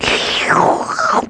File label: SLURP, HUMAN